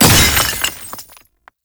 Void Heavy Scythe Crystal Explosion; Warframe; Duviri; Incarnon Adapters; Melee

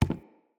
Walk Wood, Footsteps

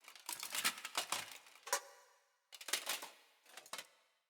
Vision Foley; Bromius; Synod; Envoy

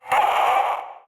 Vocal Pain

